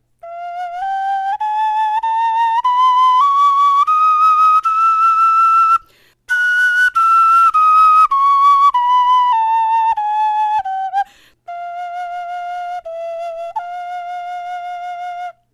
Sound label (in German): tonleiter, knochenfloeten